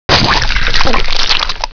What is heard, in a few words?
beak birther gib